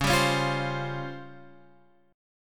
C sharp Major 7th Flat 5th, chord

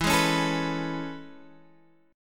A Major 9th E, chord

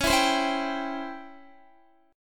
Db Minor Major 7th Flat 5th Db down Guitar; strummed

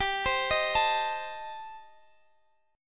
A Diminished, chord; Adim/G; arpeggiated; chordshape

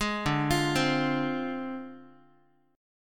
D Minor 7th Flat 5th Guitar; Dm7b5; arpeggiated

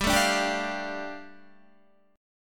G 7th Suspended 2nd, Guitar; G7sus2 strummed

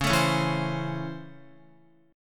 Db Minor Major 7th Sharp 5th Db down Guitar; 4E,4A,5D,5G,5C,6F; strummed

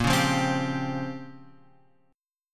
A sharp Minor 6th Add 9th; A#m6add9 Chord {6 4 3 5 X 3} strummed

